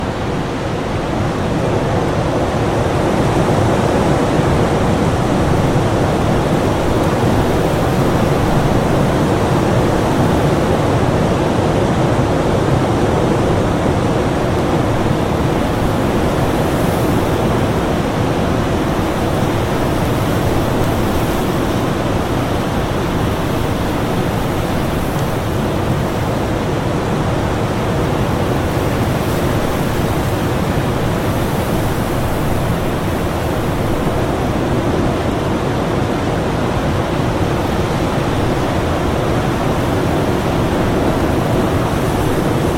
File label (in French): Blizzard, Vents